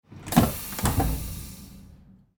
train door compressed air

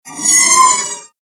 agressive motion cut

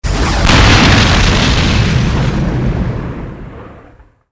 war zone big explosion

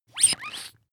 bath duck squeeze

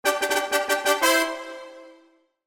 happy fanfare

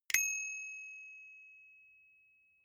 bicycle street bell

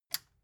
metal button push power off

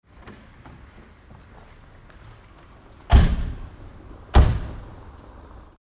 car door close and keys

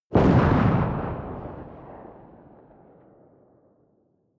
missile small explode distant

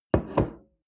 bowl place on table

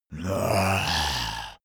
zombie moan idle throat

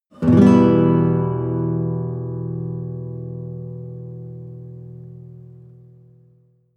nylon guitar chord full major soft

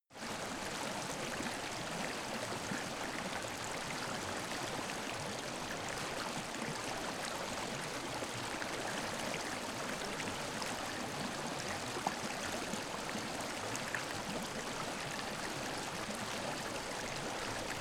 stream bubble light

